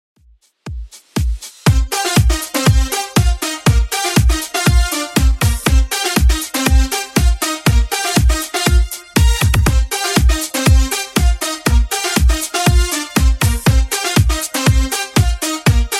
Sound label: 80s Redrum 120bpm